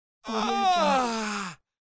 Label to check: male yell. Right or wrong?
Right